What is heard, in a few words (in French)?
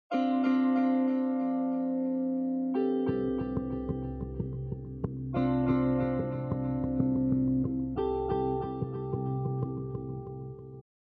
Pluie